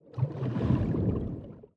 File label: spin start; netent